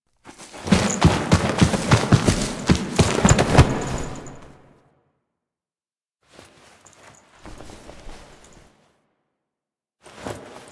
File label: Foley; Drifter Lotus Chase Intro